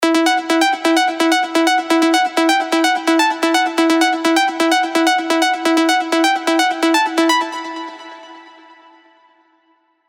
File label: Emin (128 BPM), progressive arps; revealed progressive arps vol 2